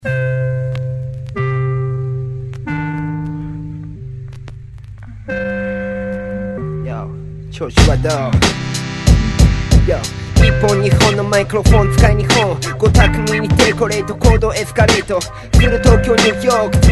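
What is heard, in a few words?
hip hop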